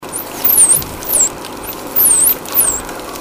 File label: SW, dolphins signatures